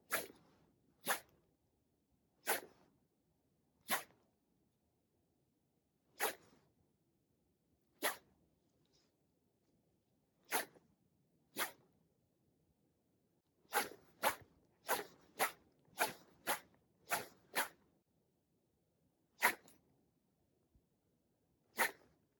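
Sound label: vetka vzmah, branch swing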